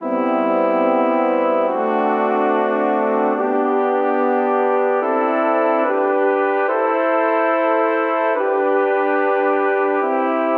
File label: Progression IV-V7-I-iisus-ii-I-IV G Major 80 bpm, Brass Quartet; MIDI Clips; Journey's End; Ableton; Spitfire Audio